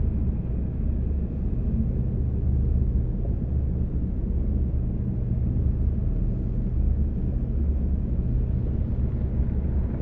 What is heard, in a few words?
titan idle; aquatics